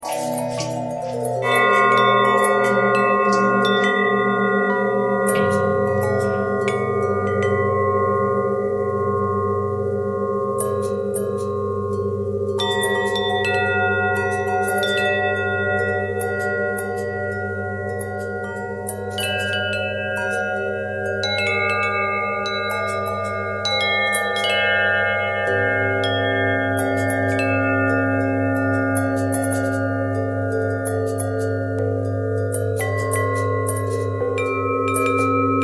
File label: bell long bell bend twist, bells